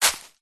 foley footstep single soft shoe dry leaves forest floor; zapsplat